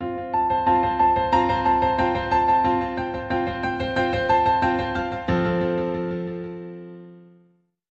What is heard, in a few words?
Success Story, Sting